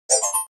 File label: zip